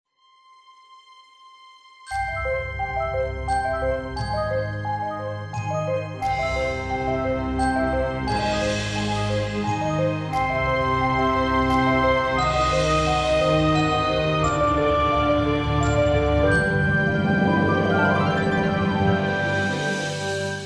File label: overture; Ginger Beyond the Crystal